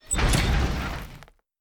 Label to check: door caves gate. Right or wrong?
Right